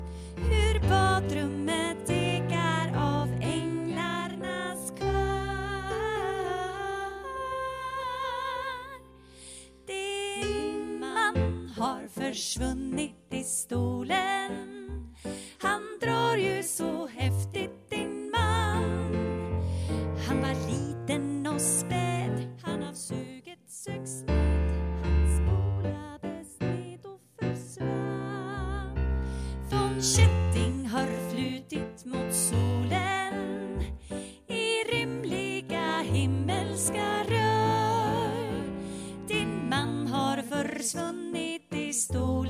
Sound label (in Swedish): Sopran Senior